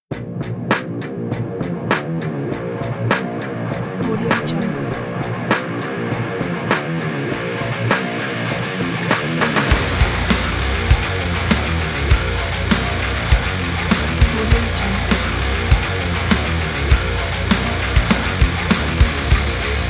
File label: furious angry metal